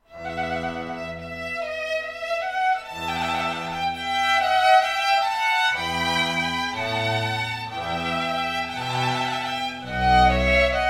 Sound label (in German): Sonata E-Moll; trauermusik; Op. 7 Nr. 8; Willem de Fesch